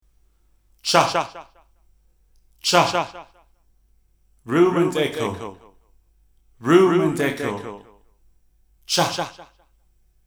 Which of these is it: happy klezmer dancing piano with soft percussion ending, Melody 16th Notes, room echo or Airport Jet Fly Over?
room echo